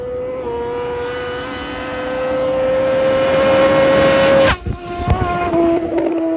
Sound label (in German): motorrad